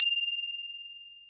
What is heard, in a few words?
Fing Bel lp, EMU Orbit-3 drum machine; 300 Drum Machines